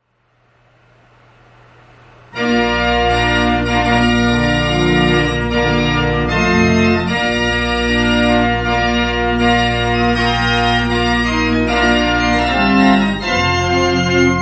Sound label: bridal chorus, organ